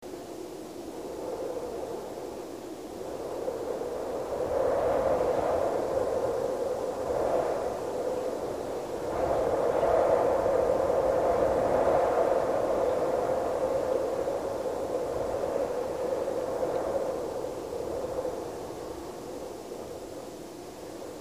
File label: Ambient Wind-Light Loop